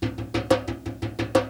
ammo loop off, Tribal drums, Several loops of hand-drumming with a tribal drum fee